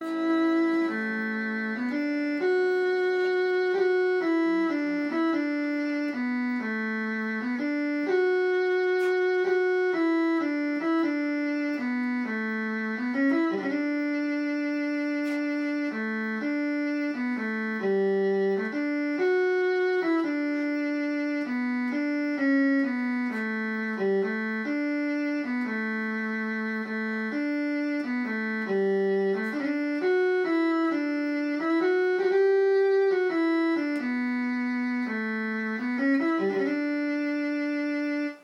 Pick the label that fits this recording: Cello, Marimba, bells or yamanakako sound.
Cello